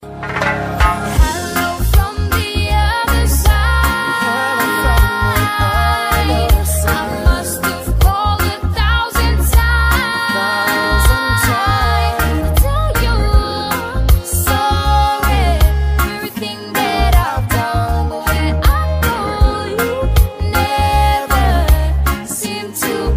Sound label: REGGAE COVER